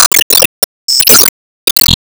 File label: Enemy Glassy Common Appear; Enemy Ufoblock Common Appear